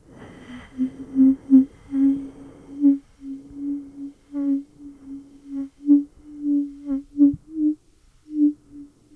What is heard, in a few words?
ghost uuu; 女系 霊 14; 霊の息 ううう〜 うう〜 左右に移動